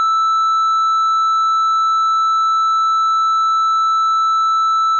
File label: tone